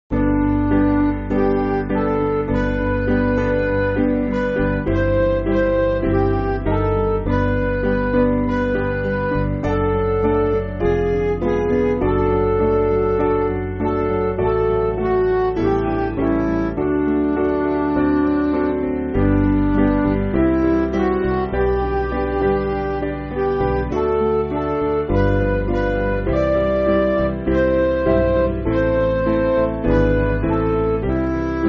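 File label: Midi, Music Backing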